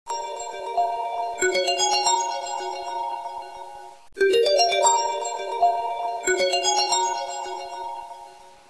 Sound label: Huawei Free, ringtone